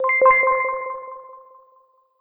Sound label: Ping; tempest 4000